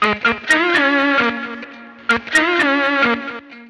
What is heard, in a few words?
Soul Riff